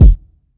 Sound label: fat bass drum single hit f key; kick drum samples